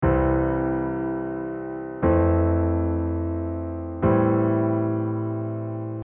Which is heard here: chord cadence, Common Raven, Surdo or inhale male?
chord cadence